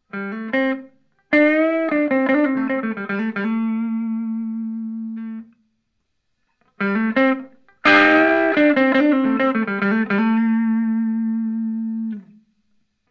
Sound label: blues lick clean; crafter sa; guitar